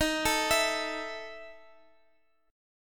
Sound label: E Major 7th Suspended 4th Eb, chord